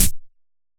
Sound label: snare; goodtakimu drumkit; fl studio; drumkits